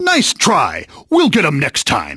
Game Lose Game; kids-tetris

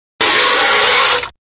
spraying, Firehouse; kids-tetris

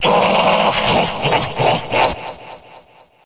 laugh; undertale

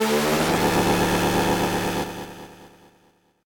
chainsaw; undertale

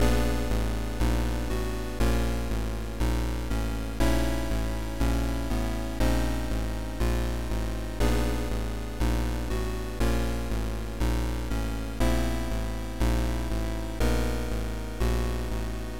BGM 1st Loop; 774 deaths

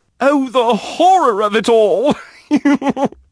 Lose Game; kids-tetris; Haunted

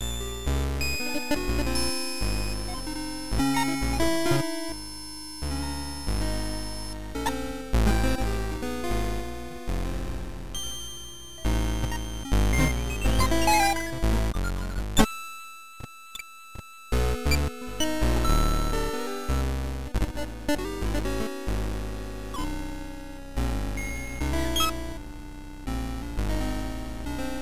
BGM 1st Bug Lv3 Loop; 774 deaths

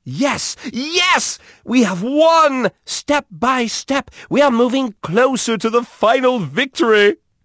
Win Game; kids-tetris; Haunted

